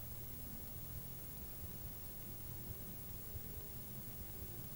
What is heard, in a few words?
blank tape; audio snippets from the cutting room floor